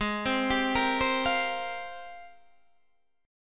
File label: Ab Major 13th Ab, down, Guitar, Open D